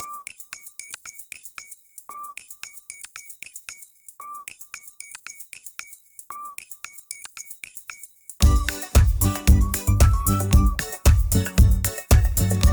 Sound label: dopamine ukulele